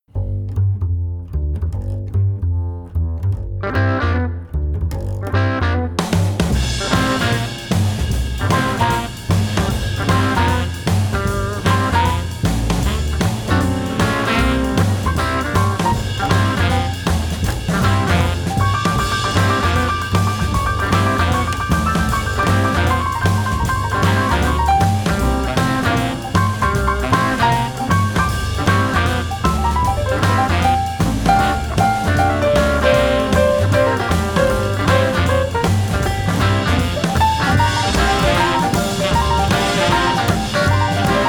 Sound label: backwood blues